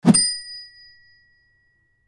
Cheerful swipe transition; shorts sound effects

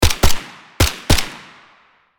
FN SCAR assault rifle multiple shots; gfxsounds

